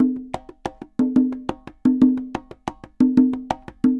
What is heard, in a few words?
Congas, Festa Latina, Drums & Percussion